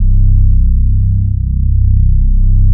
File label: bass in C; 'Effection' samples; lolicore